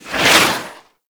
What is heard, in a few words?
Tank Missile Barrage Whizzby; Warframe; Lasrian Tank; Abilities